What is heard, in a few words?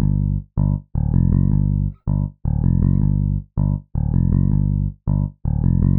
bass